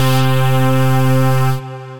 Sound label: Movement Keys, Synth Keys; Drakernian